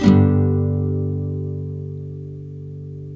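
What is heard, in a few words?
NYLON, G MAJOR UP, CHORDS; Spectrasonics; Hans Zimmer Guitars VOL-1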